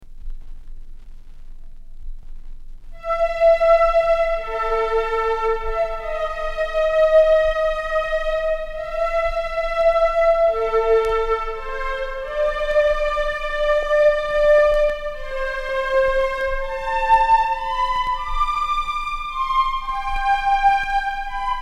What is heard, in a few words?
unaccompanied solo tune